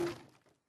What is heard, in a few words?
Wood Furniture Impact Soft